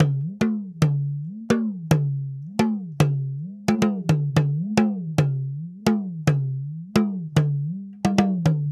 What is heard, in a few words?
Talking Drum, African